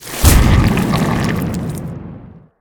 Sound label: slime, combat enemy; CosmicRage; vacus